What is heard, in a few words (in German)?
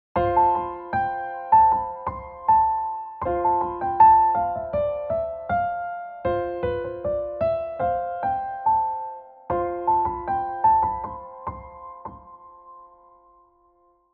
lydisch